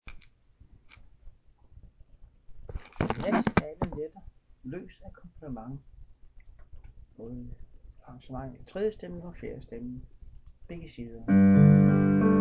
akkompagnement; Den nat af alle

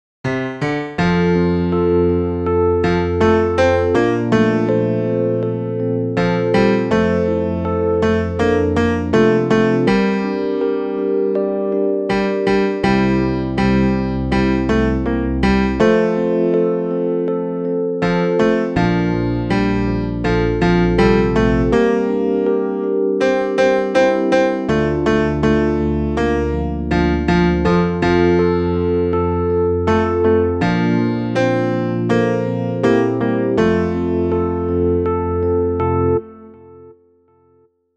tenor

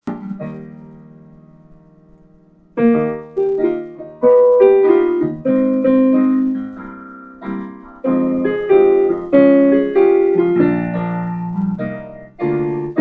sopran

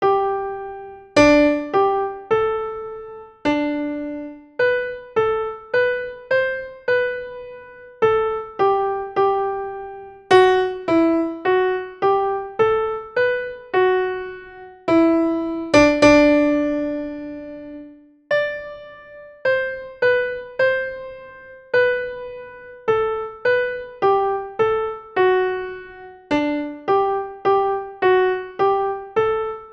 sopran; Kom alle kristne

